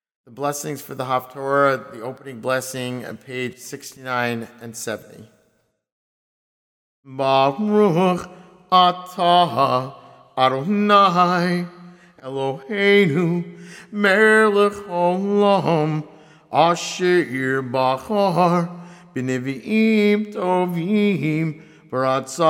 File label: Haftorah Blessing